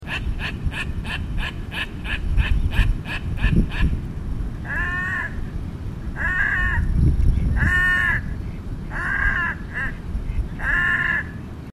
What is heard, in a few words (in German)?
rothalstaucher